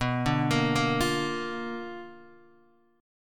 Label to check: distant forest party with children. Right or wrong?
Wrong